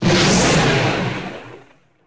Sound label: explo gas; impacts explos